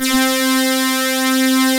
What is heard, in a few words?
MIX STABRS, SYNTHS; Pro-Rec - Synthsonic